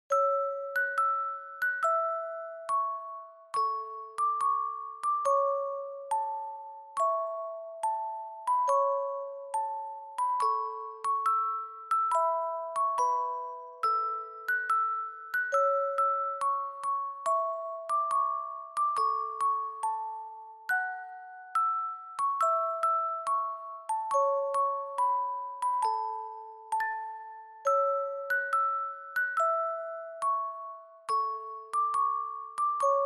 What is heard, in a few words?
Reverb Slow; bgm; Yuki